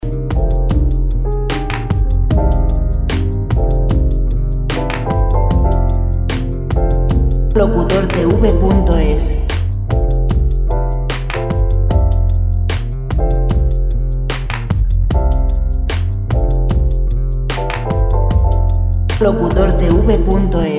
musica libre 75 lent piano; Música sin copyright para centralitas telefónicas; mensajes de de espera

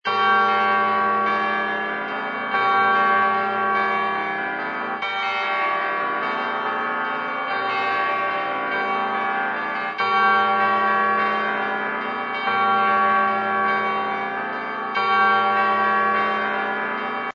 campanas navidad musica

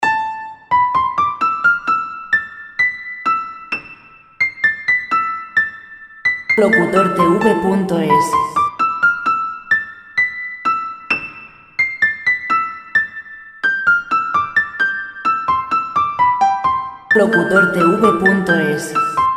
capricho 130 piano classic; Música libre de derechos

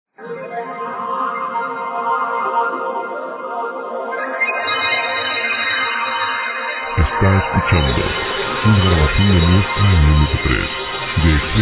ambientes chill

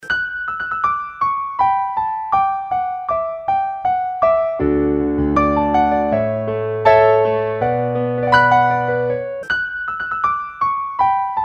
musica piano clasic80; canciones sin copyright

